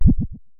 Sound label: Synare Filter; Star Instruments Synare 3